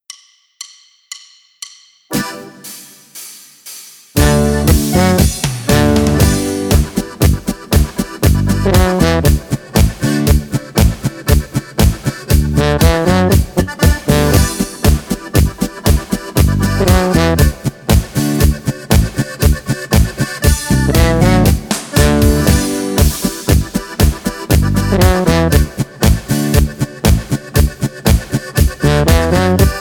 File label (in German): A D G C Hoerprobe